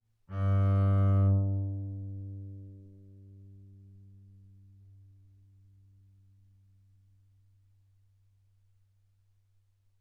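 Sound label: Bass arco sul G fortissimo G2 B2, double bass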